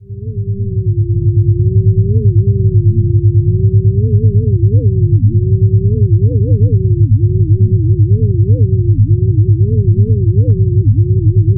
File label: Simple Sine